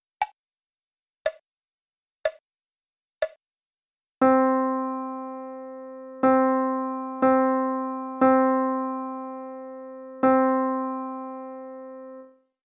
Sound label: rhythms